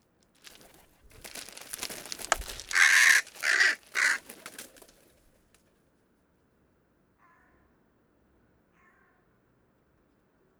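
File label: hooded crow corvus cornix cornix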